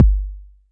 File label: BD; TRX